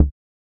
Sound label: Glitch, One Shots, Glitch FX